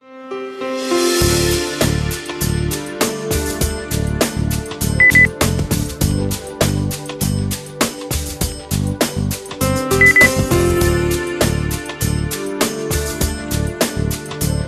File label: percussion